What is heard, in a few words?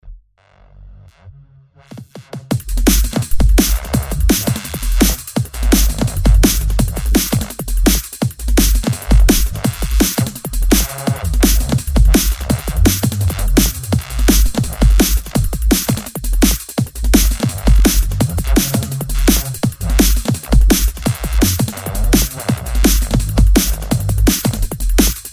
BEAT